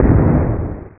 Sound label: Byeball; DX-Ball